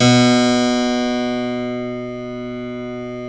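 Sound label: B1 clavinet right, Keyboards Acoustic; USB Soundscan vol.17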